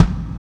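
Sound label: kick, Roland; Club-50 - Foundations Roland; Ext.Snare 4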